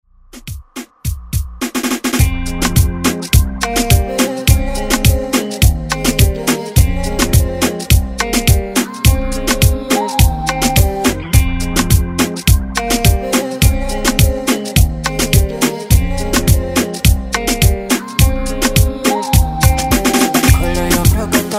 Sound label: Moombahton Afro Redrum